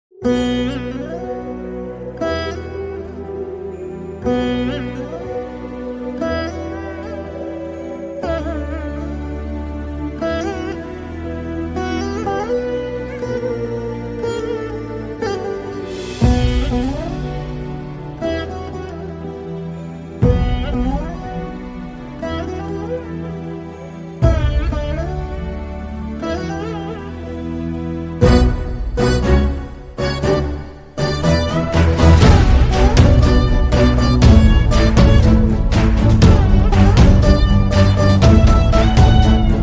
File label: Veena Theme